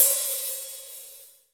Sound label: HH hh; Best Service Gigapack 2